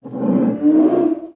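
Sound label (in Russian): яростный грозный протяжный рев раненого медведя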